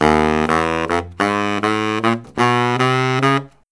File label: BARYT, Killer Brass Riffs; USB Soundscan vol.29 - Killer Brass Riffs; AKAI